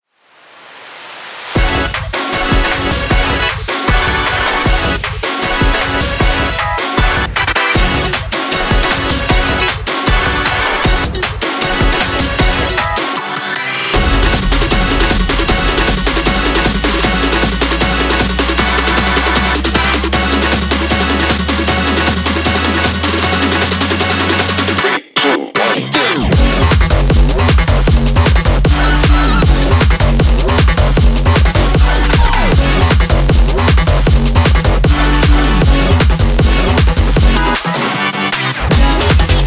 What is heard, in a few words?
Instrumental Remix